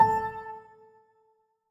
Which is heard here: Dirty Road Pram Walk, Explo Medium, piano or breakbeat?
piano